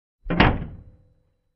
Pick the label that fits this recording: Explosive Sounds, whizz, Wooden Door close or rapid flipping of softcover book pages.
Wooden Door close